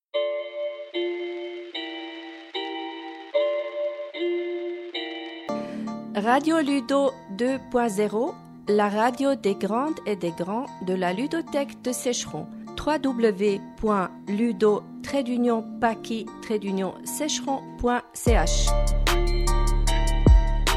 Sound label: Jingle